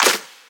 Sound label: Clap